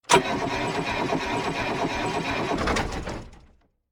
tank no fuel; factorio; Factorio 0.14.21; fight

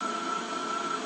transport belt; factorio; Factorio 0.14.21

